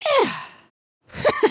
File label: adjust hat; emotes